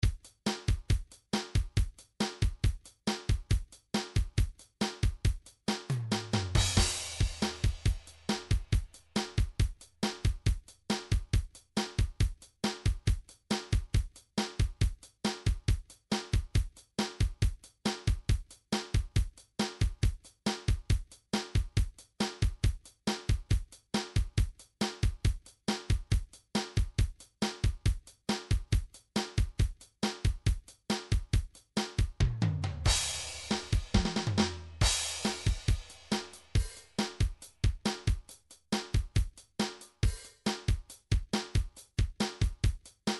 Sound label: drums, midi drum patterns; Midi Drum Patterns Vol 1 To Vol 4